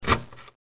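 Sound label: light switch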